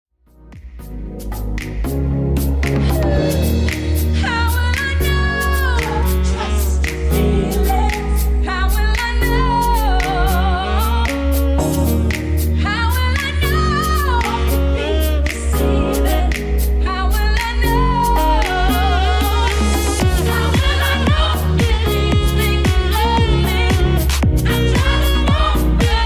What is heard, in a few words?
ft. Sax